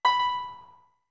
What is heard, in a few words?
Harp B short; OOT